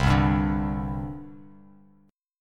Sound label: C Suspended 2nd, C, down, Bass Standard; Csus2 strummed